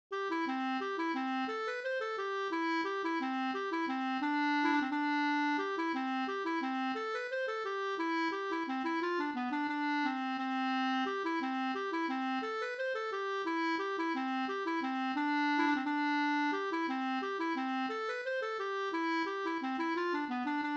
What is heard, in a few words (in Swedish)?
engelska, C G dur; fr Tolg